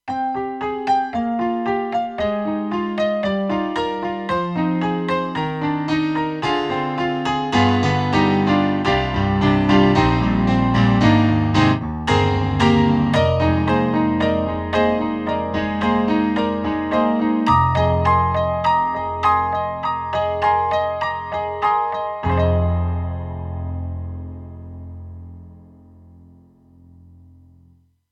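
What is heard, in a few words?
Roland JV-2080 Upright Piano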